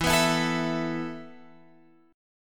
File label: E Suspended 4th E, down, Guitar, Open F; Esus4; strummed